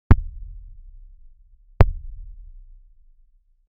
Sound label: soft thud; minecraft